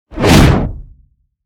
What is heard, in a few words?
Flux Wipe; Ninja Tracks